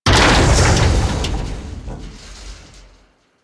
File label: Ship Hull Impact Large; Hit Effects; Warframe; Railjack Hull Hit Effects